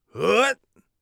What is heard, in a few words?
hup, Warrior Voice; Warrior Voice Pack